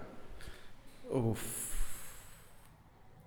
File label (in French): Soupir; Index des soupirs; Hadjithomas Joreige